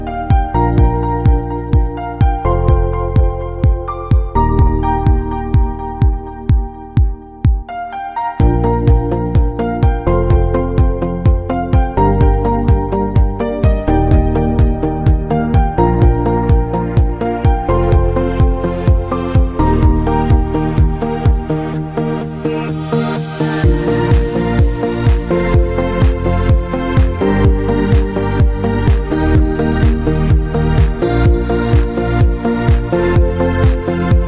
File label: music